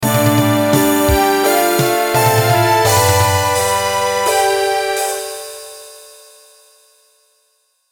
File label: fanfare